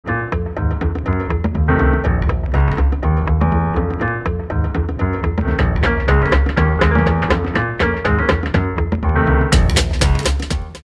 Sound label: Jazz